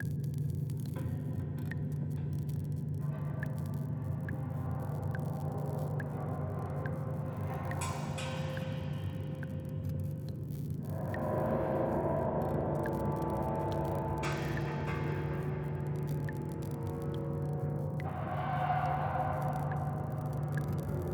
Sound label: Factory Ambience; Mads Riddersholm